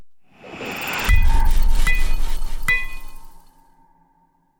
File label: mending with cast, cantrips